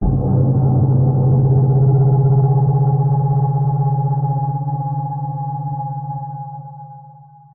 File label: Sting Impact Sci Fi Abstract Ringing Intense; Skyes Audio